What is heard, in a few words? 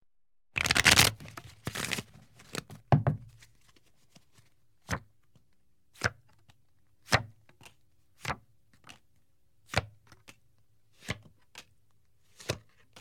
shuffle and deal; RS Games Sounds; Ninety-nine